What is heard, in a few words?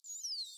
call, globi